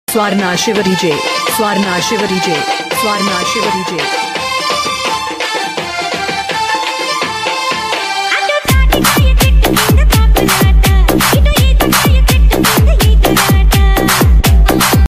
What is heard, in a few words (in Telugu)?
telugu song dj remix ringtone